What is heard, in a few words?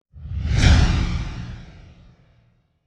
Delay swoosh